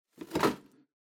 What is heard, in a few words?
Drawing Box Box Close